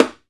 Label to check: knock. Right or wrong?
Wrong